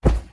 北极熊脚步声

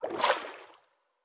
海龟游泳声

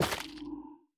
幽匿脉络破坏声

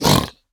猪灵受伤声

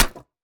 泥砖 破坏声

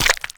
青蛙卵破坏声

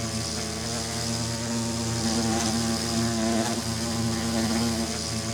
蜜蜂飞行循环声

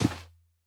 骆驼沙地脚步声

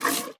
挤奶声; 哞菇

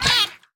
海豚受伤声

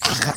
兔子攻击声

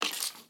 流浪者脚步声

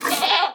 山羊尖叫挤奶声

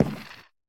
嘎枝之心踩踏声

